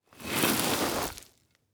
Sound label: Impact Brick Drag Through Dirt And Rock Short; Impacts